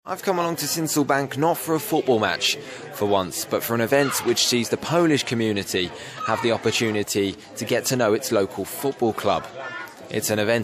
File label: siren fm